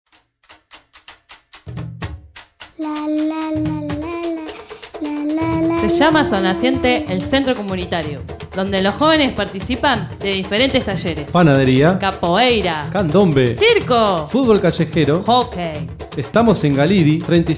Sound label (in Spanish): candombe